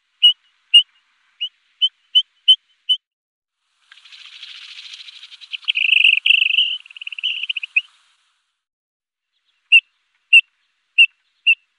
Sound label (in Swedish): Fjallpipare, Faglar